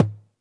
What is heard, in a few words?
Toms, Elektron MachineDrum SPS1 MKII